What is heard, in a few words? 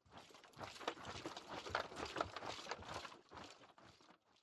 March; Age of Empires III; cinematics